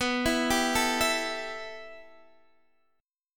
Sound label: B 7th Suspended 4th Sharp 5th, Guitar, DADGAD